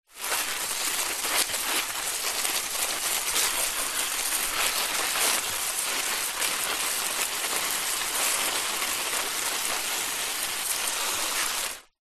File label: household garden water plant with hose